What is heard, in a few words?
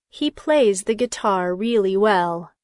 guitar; He plays the guitar really well.